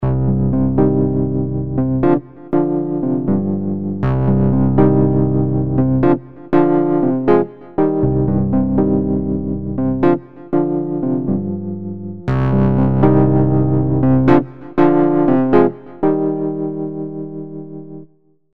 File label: Electric Piano